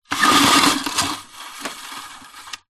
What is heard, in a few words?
household washing line retractable pull extend plastic housing